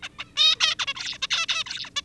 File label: plastic song